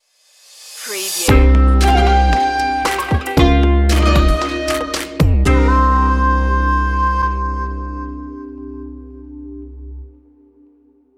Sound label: desert market stinger